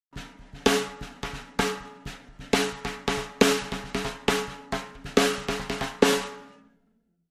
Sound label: Drums Snare Accent